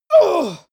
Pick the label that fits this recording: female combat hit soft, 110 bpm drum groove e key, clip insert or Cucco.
female combat hit soft